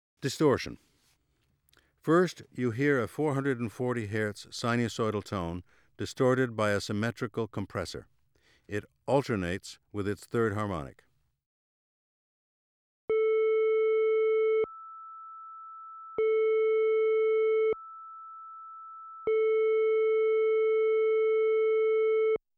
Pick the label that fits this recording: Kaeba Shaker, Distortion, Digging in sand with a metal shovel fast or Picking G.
Distortion